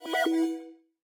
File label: Heartball Ready